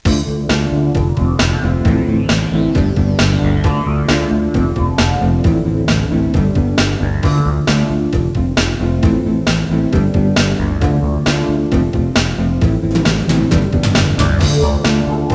muzyka

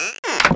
skrzyp; TajemniceDomu

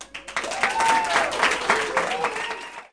aplaus; Szkola Koziolka

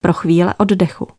oddech

